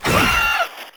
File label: Proto Infested Maggot Attack; Warframe